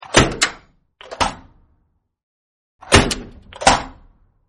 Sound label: Metal door open, Stadium Metallic Door Opening-2 Takes; Miscellaneous Doors (Sound Effects:Doors)